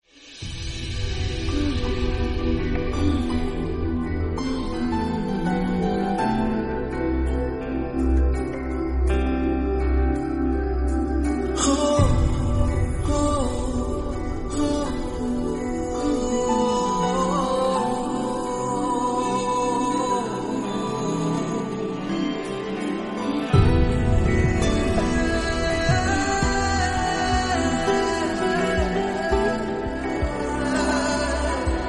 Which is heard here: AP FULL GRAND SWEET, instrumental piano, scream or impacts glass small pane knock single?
instrumental piano